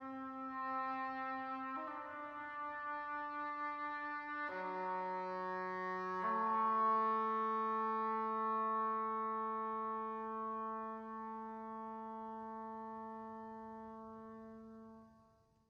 Overlay Phrase 68 bpm 4-4 meter; Planet of Lana; Sandstorm A

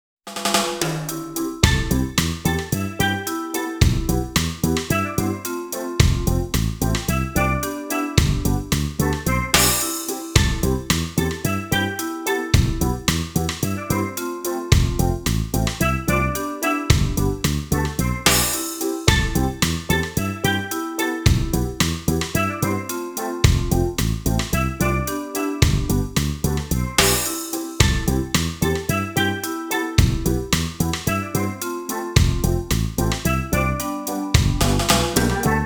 Reggae; Rurouni Kenshin Meiji Kenkaku Romantan

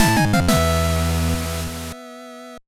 Battle Lose; Shantae Advance Risky Revolution; GBA

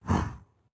Dash Attack; Caffiend Caelan

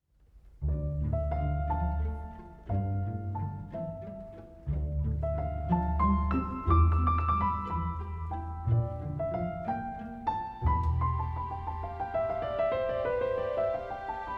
The Heart (Strings Variation); Symphonia Original Soundtrack 2024

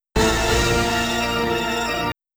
Coffin Jackpot; Coffin Jackpot P1; Scared Stiff; Bally Pinball

